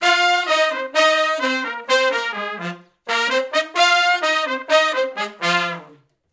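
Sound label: R B Riff (F) unison, horn; Sections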